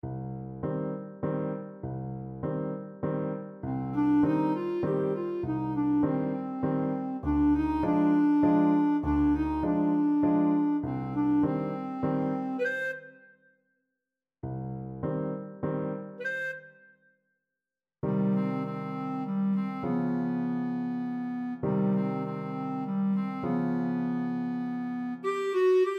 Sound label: creak crunch scream, clarinet